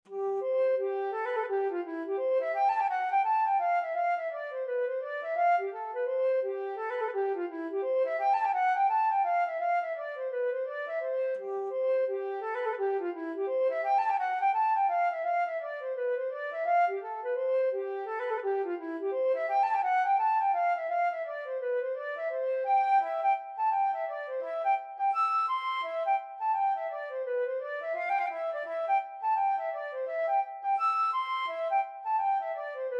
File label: flute